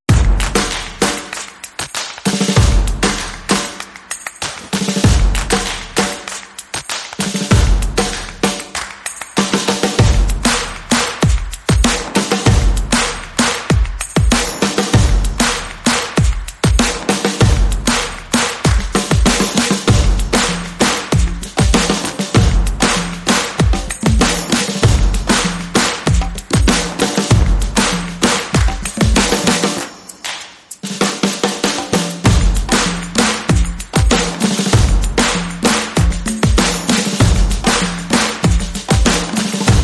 Soca, Less Percussion

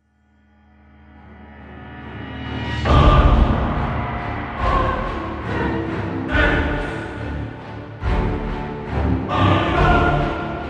Choir

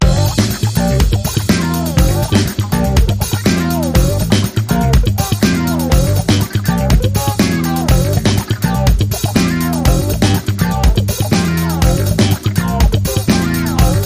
Groovy Jam, No Lead Guitar

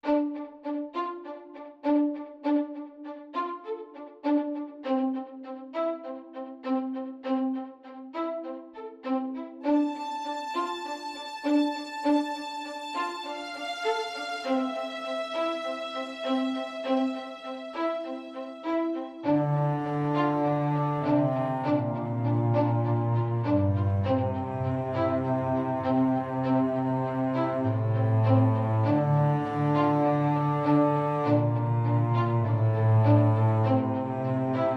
Strings

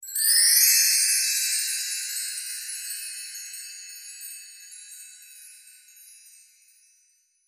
Holiday Joy Fast Chimes Roll

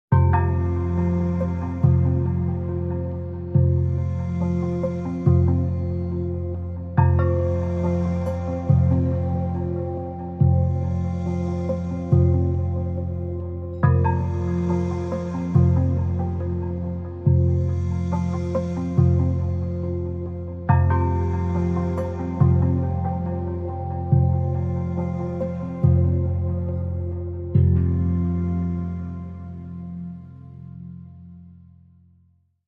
Dark Chime Meditation

